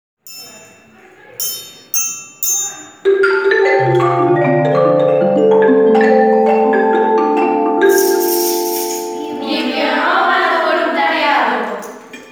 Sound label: Jingle